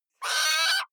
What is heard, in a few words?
owl shriek; natur tiere; voegel